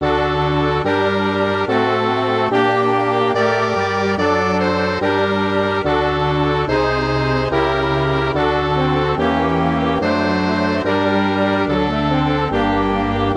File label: MIDI